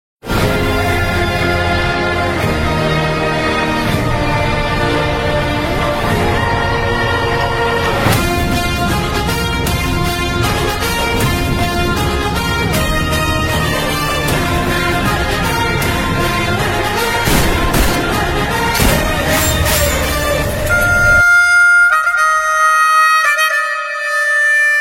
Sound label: Intro Bgm; Japan